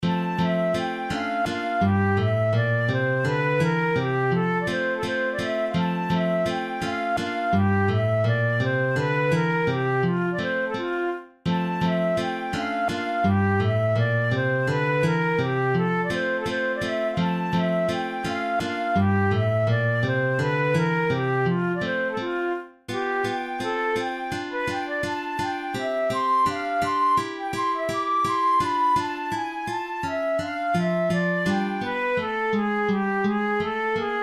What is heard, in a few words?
straight jig, flute